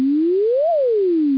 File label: tone